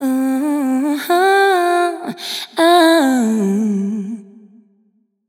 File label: vocal riff mmm ooh Dm